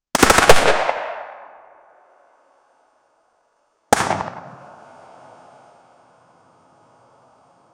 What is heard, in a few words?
outdoor, sound-effects